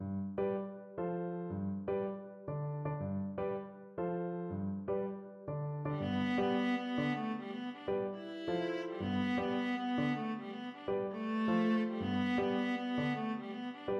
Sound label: viola